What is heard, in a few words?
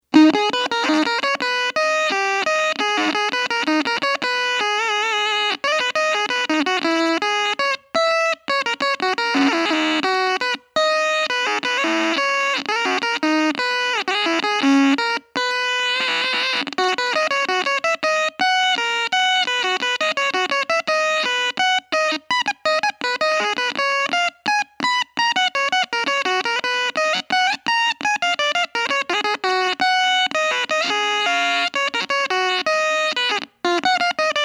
guitar bach; MSP430G2231 Guitar Processor